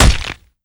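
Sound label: crowbar hit body; weapons; tf2; hl1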